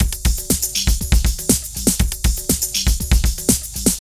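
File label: drum loops, right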